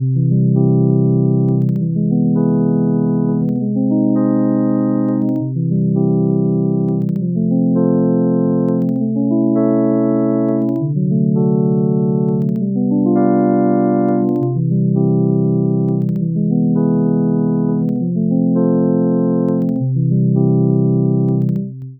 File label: twelve tet c drone progression